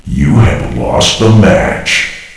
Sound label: lost match